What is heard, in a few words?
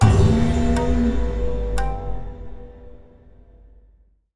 Fanfares, mus Fail; CT Fail